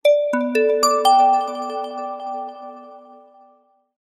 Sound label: message tones; oringz pack nine